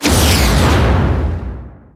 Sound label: hourglass